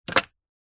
household telephone corded plastic receiver pick up; zapsplat